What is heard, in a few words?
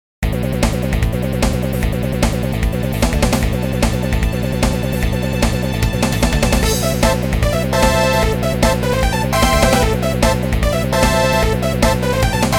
game battle